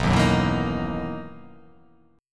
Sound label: B Major 11th B down